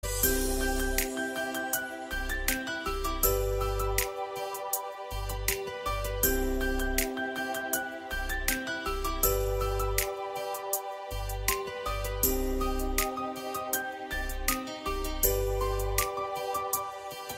instrumental ringtone

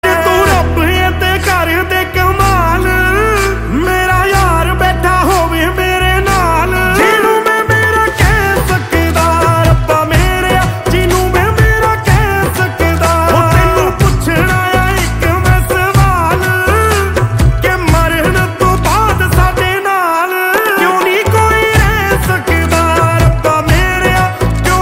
HINDI SONG RINGTONE; PUNJABI

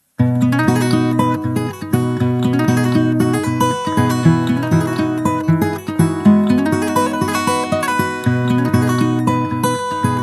RINGTONE, ACOUSTIC GUITAR

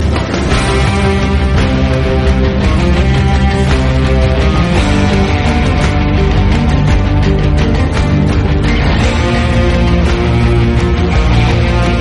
METAL RINGTONE; GAME OF THRONES

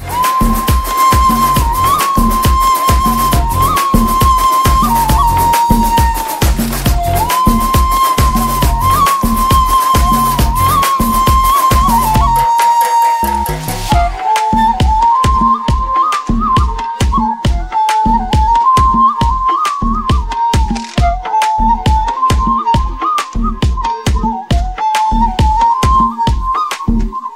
FLUTE BGM; TAMIL; THE GOAT

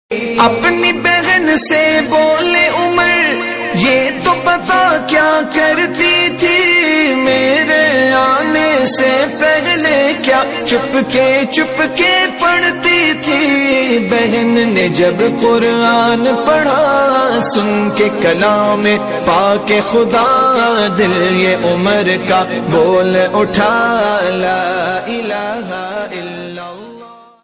NEW ISLAMIC RINGTONE

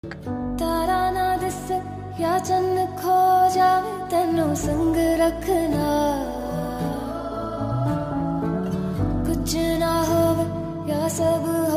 SONG RINGTONE, PUNJABI

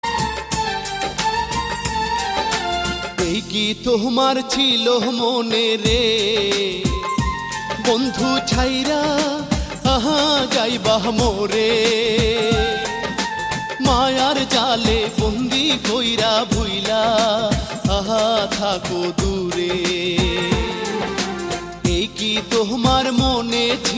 BANGLA SONG RINGTONE

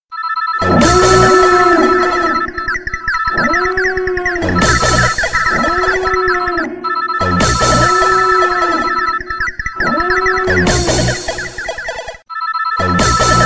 VERY LOUD ALARM SOUND

